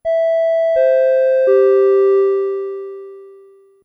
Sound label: Chime; Bosch; Chimes and Announcements